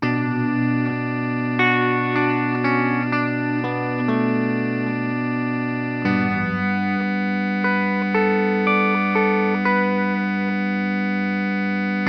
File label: EHX Pico Deep Freeze Auto Mode u Clean